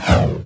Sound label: wizard fire pillar